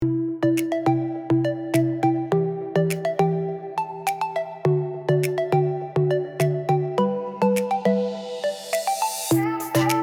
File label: Soft pop beat